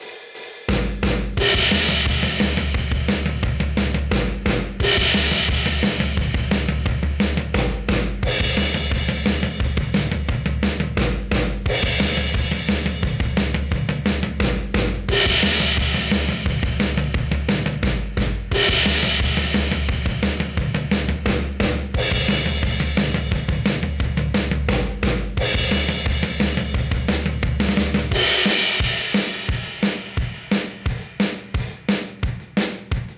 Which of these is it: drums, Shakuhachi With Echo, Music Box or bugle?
drums